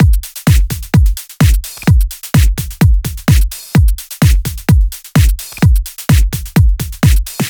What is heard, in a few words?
Housebeats 128BPM; Vengeance Samples